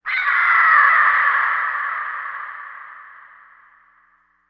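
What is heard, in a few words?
Animal Stinger Eerie; stingers & whooshes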